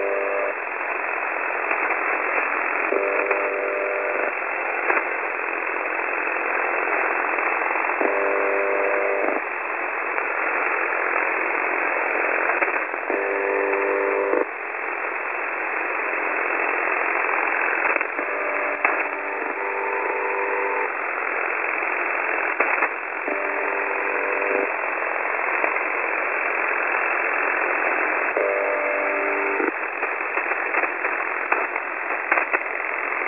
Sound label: Buzzer like, 5270.0kHz